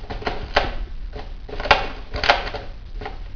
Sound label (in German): holznag